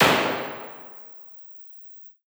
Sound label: EMT 140 Plate